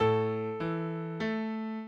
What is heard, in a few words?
minuet, mozart